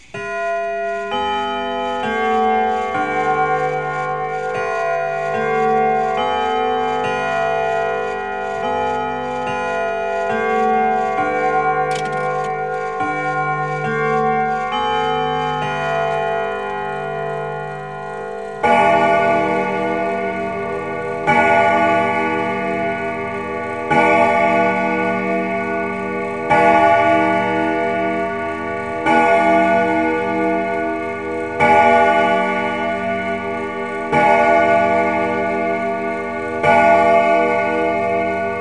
Westminster, Chimes; Household

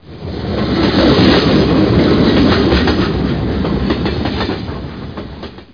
Train

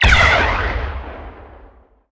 synth laser

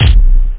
jungle-bd+hat

